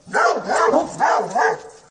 Big vicious hounds

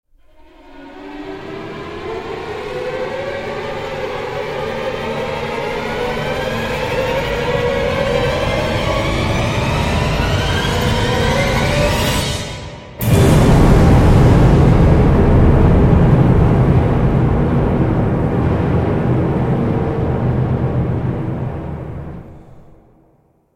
no choir

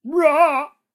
dino roar; SyrinScape; Syrinscape unplugged; Jungleplanet dinolaser battle

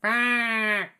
Fark bird; SyrinScape; Syrinscape unplugged; Jungleplanet dinolaser battle

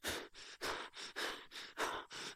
Run Grunts; SyrinScape; City gone mad

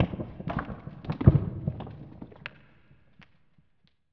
rocks; The Elder Scrolls III - Morrowind

